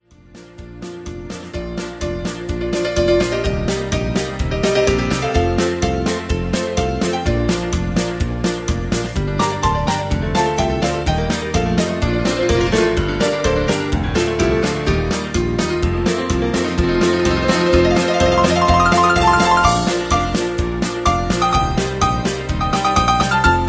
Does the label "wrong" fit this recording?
No